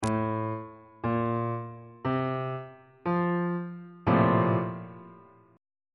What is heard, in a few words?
a sharp major 7th suspended 2nd 3rd inversion on bass clef